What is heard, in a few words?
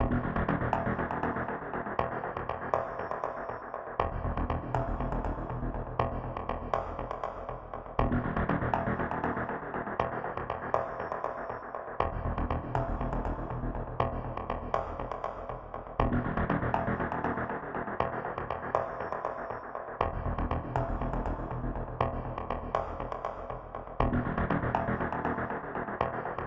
ZETAS LAST STAND SYNTH, soundtrack; transformers war for cybertron gamerip